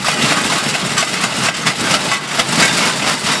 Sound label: machinery